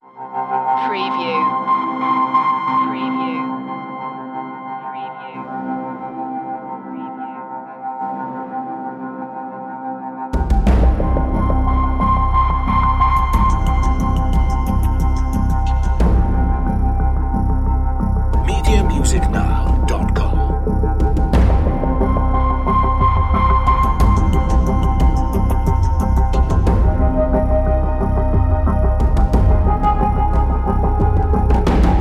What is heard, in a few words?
nervous energy no strings